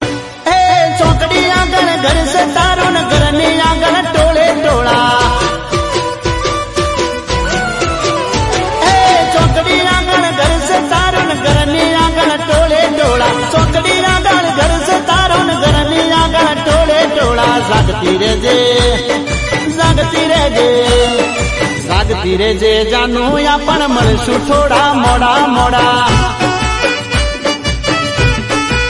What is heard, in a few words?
Gujarati Song; ringtones